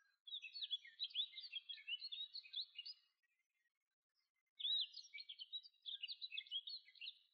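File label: kashiradaka song, rustic bunting; saezuri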